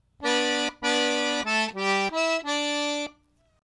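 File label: right hand licks, Phrase two; Back Door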